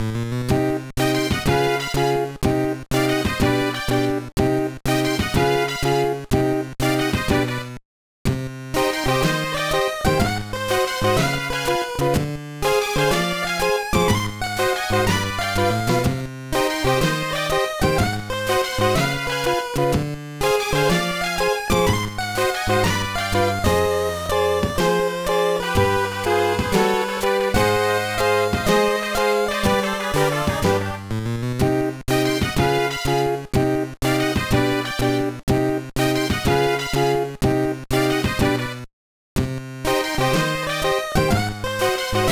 BGM; Meitantei Conan Nerawareta Tantei; GBA

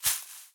footstep foliage; Scrat

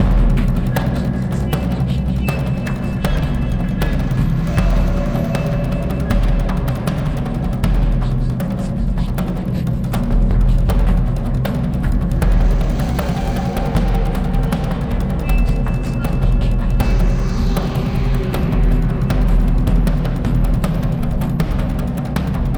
Combat Fight Hard; Eden Rising

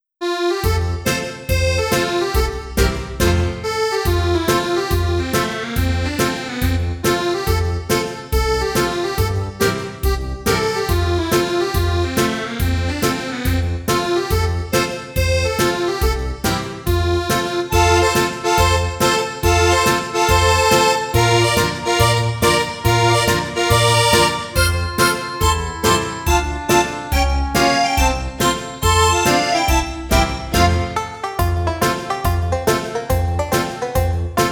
Folk Dance ~ from American Folk Song; tokimeki memorial 2 substories leaping school festival; Oklahoma Mixer

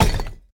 Environment Wall Hit; Pulling No Punches

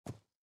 SFX run rock

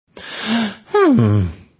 yawning; Oswald

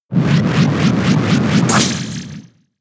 Teleport; pokemon sfx gen 7 attack moves sumo usum

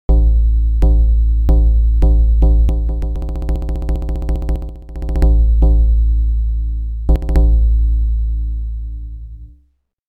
Drum Roll; Drum Roll 1 (Amakusa Demo)

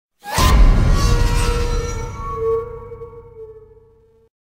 Stinger Medal

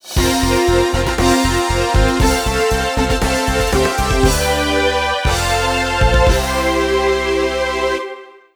Target - Super Score; Super Monkey Ball 2

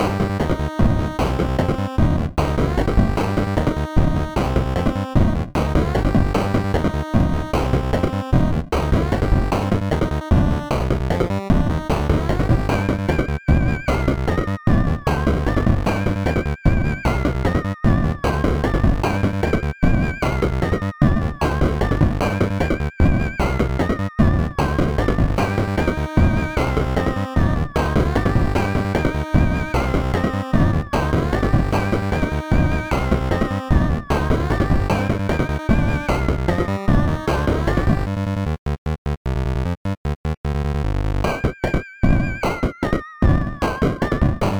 Beepbox Test Song; Timberman The Big Adventure; ps4; xbox series xs

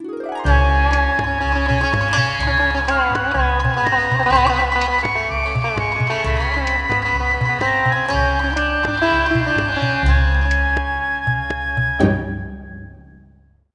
Bari Sax

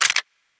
Weapon Foley

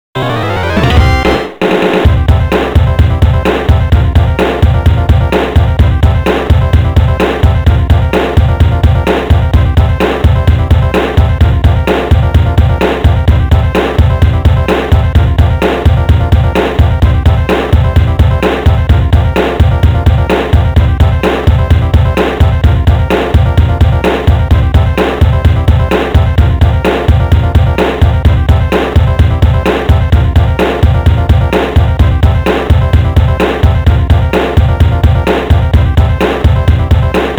Win (Winner Screen); Dragon Ball Z Super Battle 1 & 2; arcade

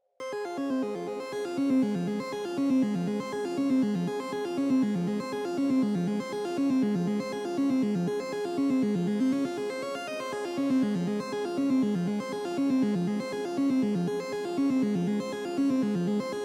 Red Fog Analog Soft; Get Even

